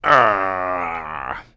SFX Emoji angry; Golf Blitz 1.12

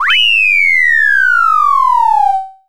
Race Complete!; Mario Kart 8

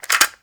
Cassette Rattle

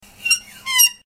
Crank Open

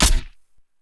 grenade pistol empty; Shattered Horizon

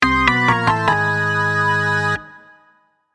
failed; ufs league switch gamerip 2022

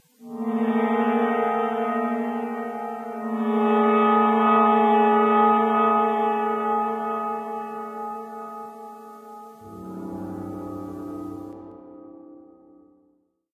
Mystic Gong; Babbdi

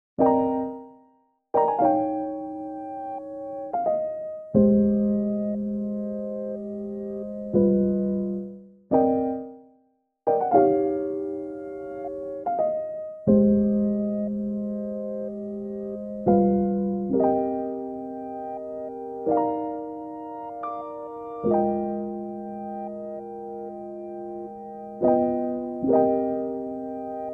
cool piano; Your Turn to Die

